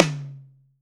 TOM 1H L, DRUMKIT

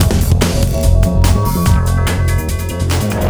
Hardcore Breakbeat, 145 Fmin; Big Fish Audio - Hardcore Breakbeat Action; Hardcore Breakbeat Action CD1; 05 Engine

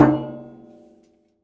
Prepared Drums

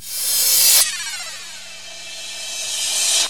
Reverse Cym, Cymbals; Vengeance; Essential Clubsounds Vol.4

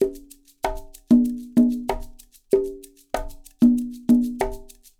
Percussionworks

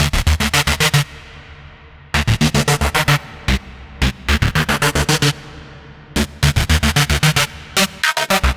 112 D Slow Psy, Melodic Loops; Electronisounds

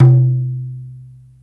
SKRA, ETHNIC PER; AKAI S-Series CD-ROM Sound Library VOL-5

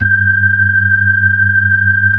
B-3, Hollow; Keyboards of The 60's and 70's

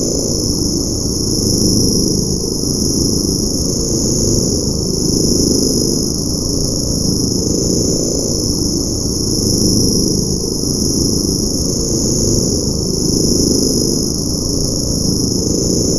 Spectral Groan; Sonic Foundry (Sony Creative Software); Loop Noir

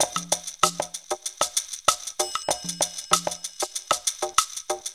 PERC LP, PERC LOOPS; Zero-G; Funky Elements

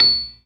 PERCUSSION, ToneWheel Organ; E-MU Formula 4000 Series Vol. 3; Analog Odyssey

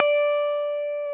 GTR Funky Strat; Funk & Mute; Club-50 - Foundations

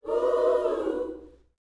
Vocal, Gospel, PHRASE; Spectrasonics

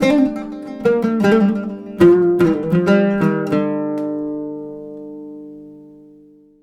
LUTH D, Arabian Traditions, LUTH RIFF; USB Soundscan vol.43; AKAI

